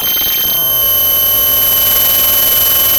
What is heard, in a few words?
Sound of a cat meowing